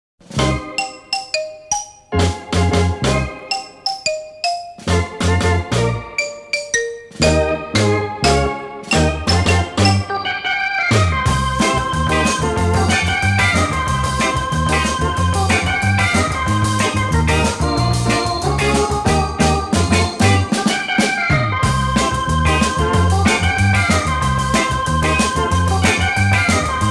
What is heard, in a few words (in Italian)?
Piccola ma beat